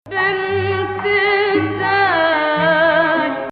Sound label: Rast Hijaz